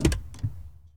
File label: Truck Explorer Door Open; Trucks